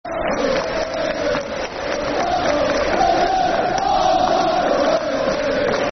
We Love You Blackpool [Football Chant (Soccer Song)]